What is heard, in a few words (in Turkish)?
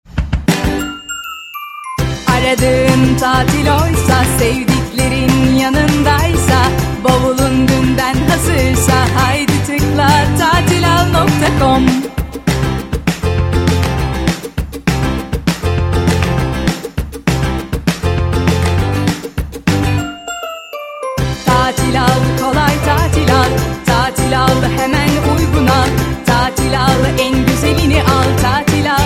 vokal, jingle advertisement